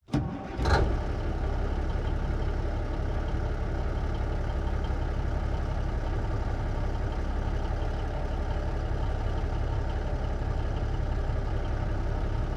1954 Big Rig Int Start Idle Away Off, Period Vehicles